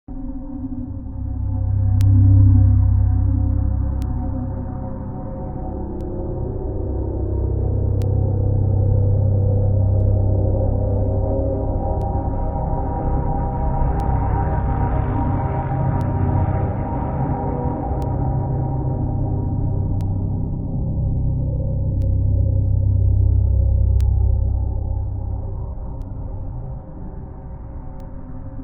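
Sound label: ambient scary loop; F.E.A.R. Extraction Point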